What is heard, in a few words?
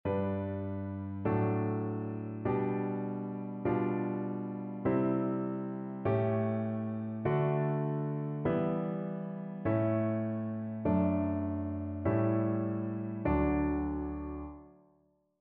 harmonic error detection; ear training